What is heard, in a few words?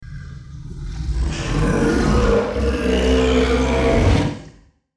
monster roar; dinosaurs; ultimate dinosaurs